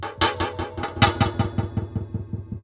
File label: Delayed, Wire & Tin, contact mic